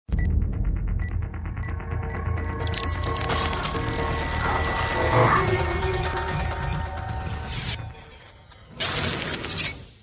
sms tune ringtone

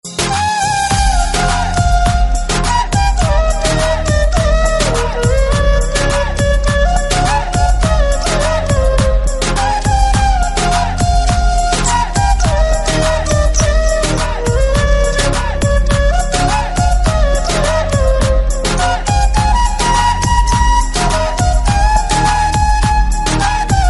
romantic instrumental ringtone

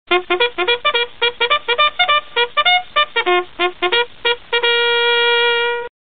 military call background music tone ringtone; samsung ringtones

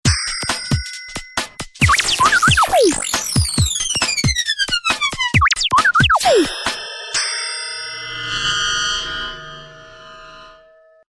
ovi deers new tone ringtone; samsung ringtones

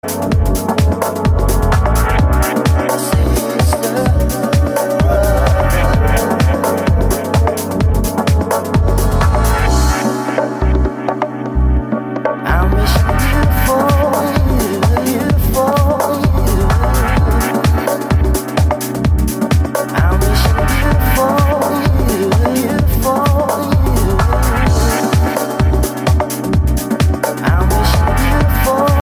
lovely relaxing beautiful musical tone ringtone

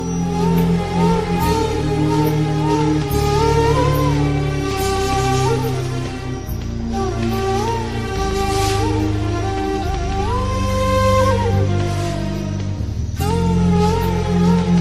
rhythm ringtone

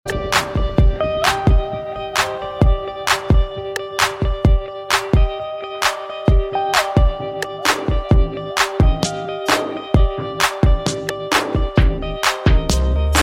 highway cool tune ringtone

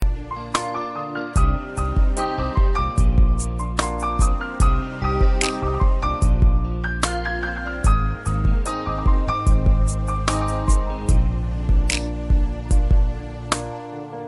sad instrumental ringtone

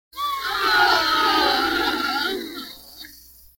girls sigh ringtone

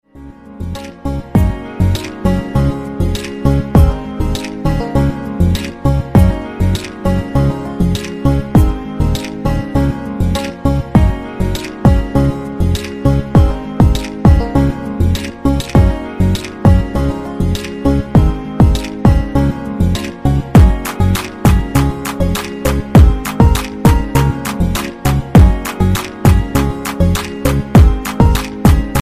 soft tune ringtone; iphone ringtones

